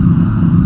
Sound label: fast, ships engine; Quantum Redshift; XBOX; tara